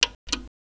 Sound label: reset; NEC PC-6001; PC6001VW3